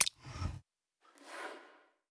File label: end of month menu click move